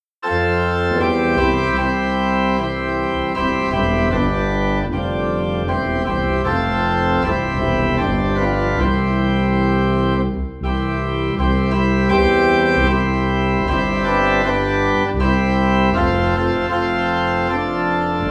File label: Organ Low in C; The Solid Rock